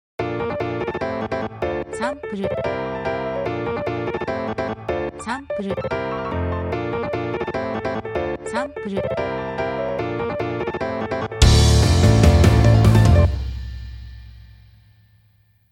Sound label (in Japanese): イントロ